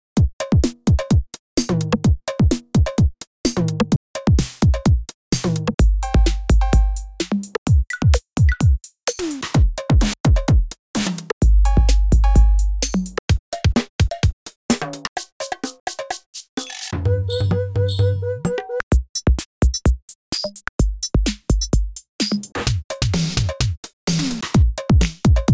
Roland MC-707 Drum Kits

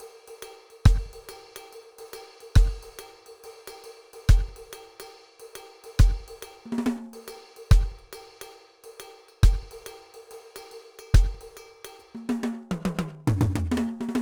Stereo Mix; EZdrummer 3

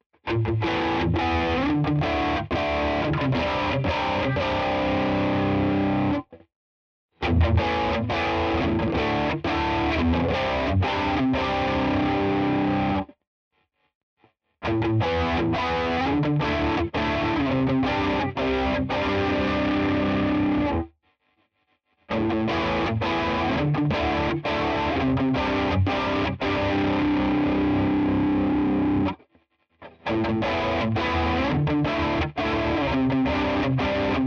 Flanger crunch; AxisFlanger; all on 12 Speed 5

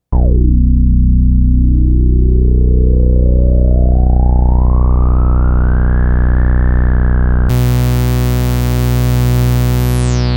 Sh-4d Bass Sweep Live

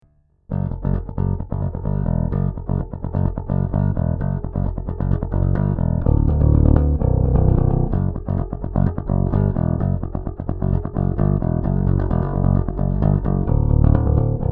Harley Benton BZ 5000 Plec passive

